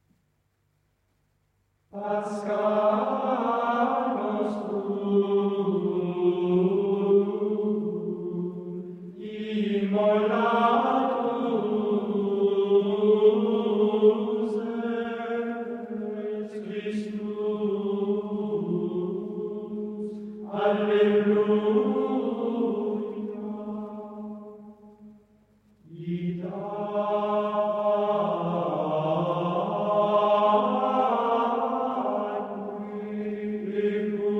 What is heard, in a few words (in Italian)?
gregoriano; canto gregoriano; liturgia pasquale